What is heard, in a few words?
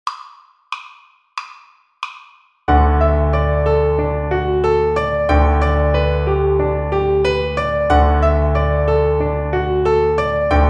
String Gym, Piano Accompaniments